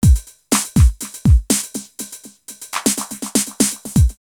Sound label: 909 Break 122, drumloops, DrumMachines; X-Static Goldmine; 90 s Sample CDs